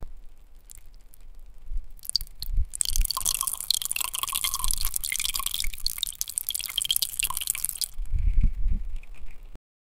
the sound of water being, burial

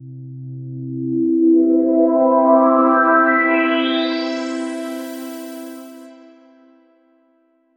modern, corporate

